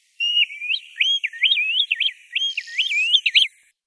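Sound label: Asian brown-winged plover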